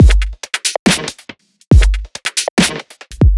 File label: 140 drum loops; Poondah; M8; Electronisounds